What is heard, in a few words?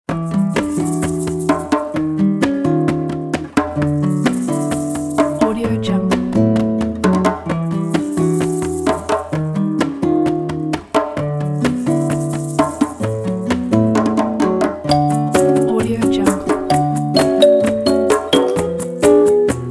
rainforest lullaby